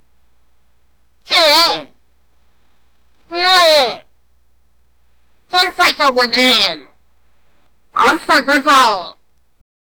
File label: samba beat